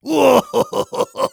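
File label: laugh evil, German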